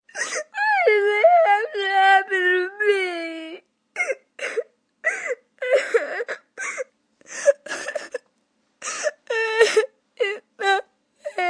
Tantrum Why Me, Temper tantrum, 1 child, 9 yrs., whining, sobbing, crying, yelling; Screaming; VoiceBox